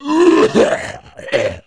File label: cough; Messiah